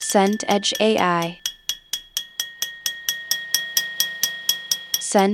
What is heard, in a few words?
texture glass harmonic